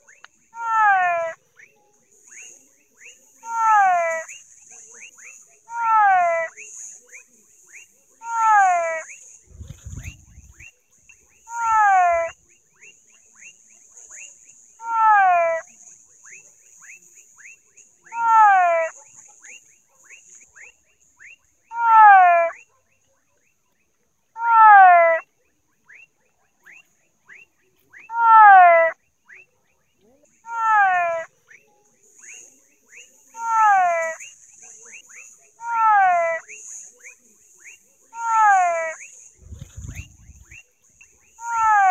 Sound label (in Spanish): RANA VAQUERA